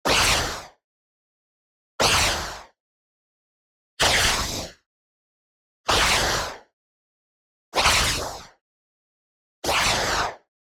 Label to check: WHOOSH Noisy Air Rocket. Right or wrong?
Right